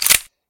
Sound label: collect; tekpro